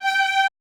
VA QV F G4; AKAI Advance Orchestra Upgrade 97 Vol.1

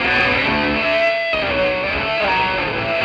Krush, Electric Guitar; Sonic Foundry (Sony Creative Software) - Friendly Fire

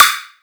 somethingstep snare

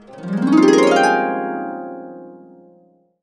Complete Harps; USB Soundscan vol.62; AKAI

